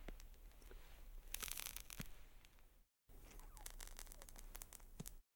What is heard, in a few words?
smoke; CosmicRage